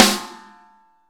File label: SN ambient; Best Service; Real Mega Drums VOL-1